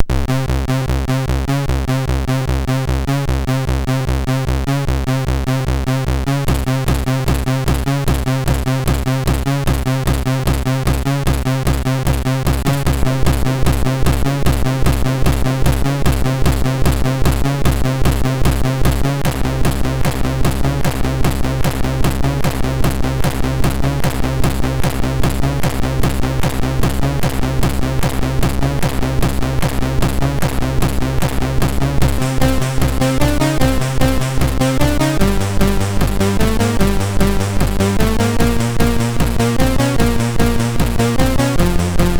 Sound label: Life Is Brutal, MOS6581R3